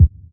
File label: hot kick drum f key; kick drum samples; single hits one shots